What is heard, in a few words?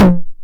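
909 high tom tape saturation; Goldbaby Tape808 & Tape909; The Tape 909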